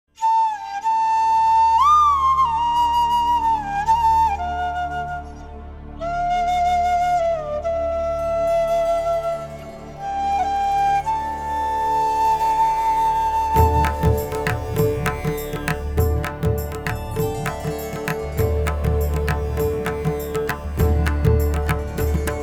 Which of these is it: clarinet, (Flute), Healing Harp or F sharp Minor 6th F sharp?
(Flute)